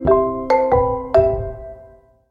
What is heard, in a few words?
Music Tone Ringtone; Oppo